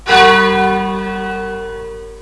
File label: Bell; OldBells